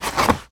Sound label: food egg box lid open